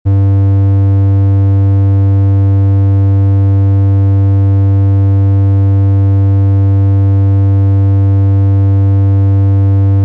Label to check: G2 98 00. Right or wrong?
Right